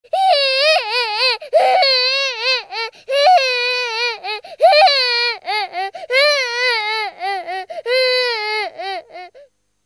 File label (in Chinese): 小男孩哭声 哭声